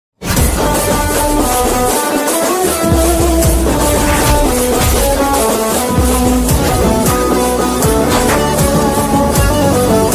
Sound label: ringtone music; Prince movie